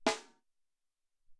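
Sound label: Hi Snare Lite, Alesis SR-18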